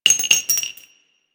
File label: Shell, Weapons; Dual Vipers